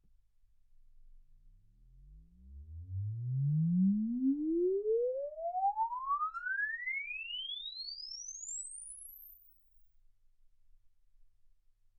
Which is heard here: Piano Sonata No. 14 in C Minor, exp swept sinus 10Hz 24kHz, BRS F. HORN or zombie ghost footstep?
exp swept sinus 10Hz 24kHz